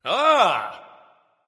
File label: "ah", discover; smite; voicepack; ullr